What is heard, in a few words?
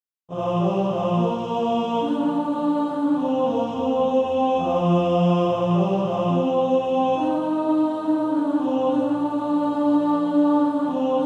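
Male Choir, tenor 2; Jesus Lover of my soul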